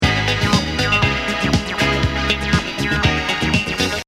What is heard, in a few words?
superloop 120; original